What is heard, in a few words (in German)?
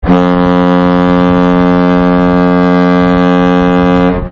norwegian gem, kreuzfahrtschiff klingelton